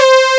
synthesizer

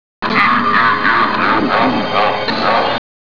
laugh

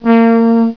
brass

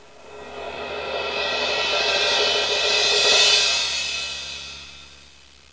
cymbal

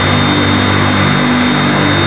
heli running

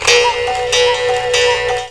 clock cuckoo

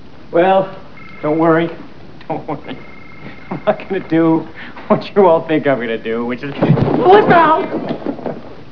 flipout

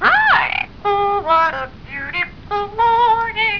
bird talk hello

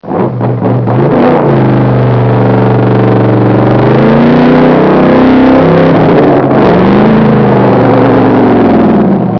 car running

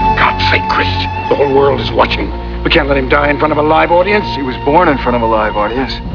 audience

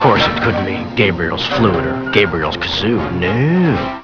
kazoo

